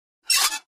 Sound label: Metal Scrape On Metal